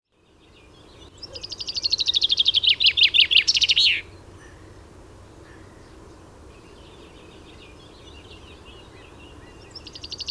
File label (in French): pinson des arbres, Pinson des arbres; vourey les goureux 18 juin 2000; enregistrés par Jacques Prévost de la LPO; chants d'oiseaux